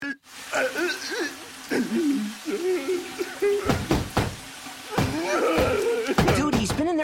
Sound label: crying muscle man